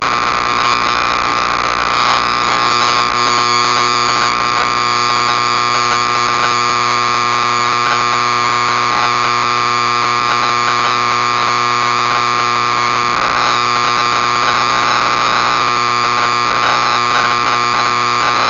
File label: 2m powerlines AM